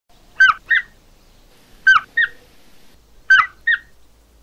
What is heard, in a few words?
Marmot